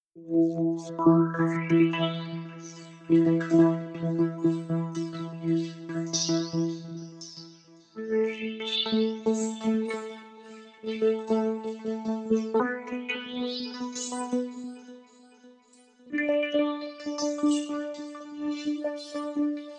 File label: electronic vocoder